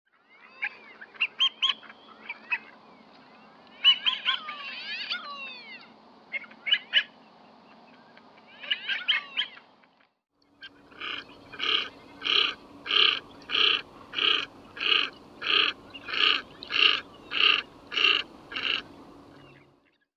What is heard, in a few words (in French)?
Fuligule milouin; sonotheque ornithologie